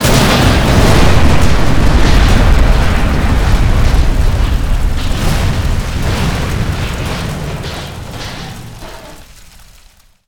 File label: Explo Large Metal; SFX Kit; Destruction